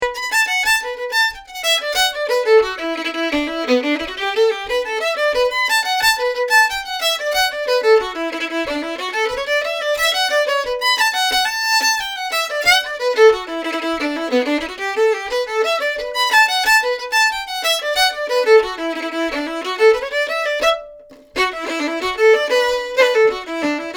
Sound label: Violin; senior audition